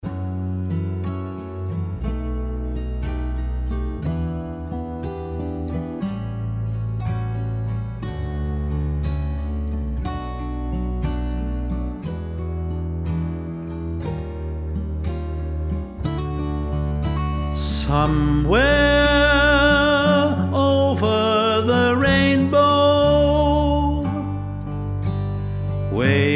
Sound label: 2git bass voc